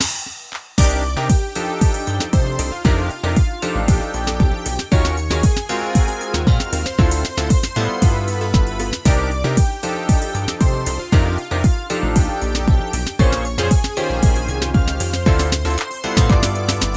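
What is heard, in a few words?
BGM Toy Sports Result Lose; Clubhouse Games: 51 Worldwide Classics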